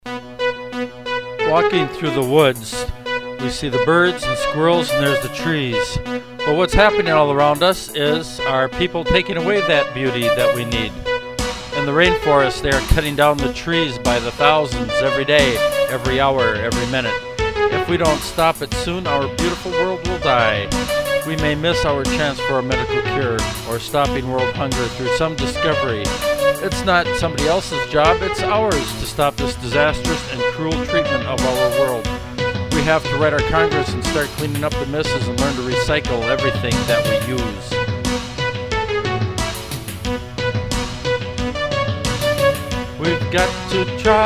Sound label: vocal